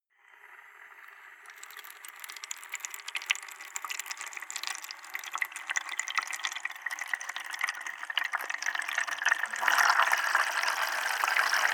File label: flowing submerged small long